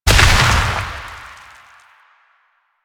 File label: Proc Explosion Max; Mods